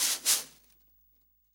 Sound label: SWING SNARE CHROMATIC, Real Drum Kit; REAL DRUMS